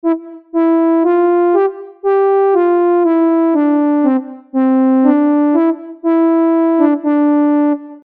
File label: flute ode to joy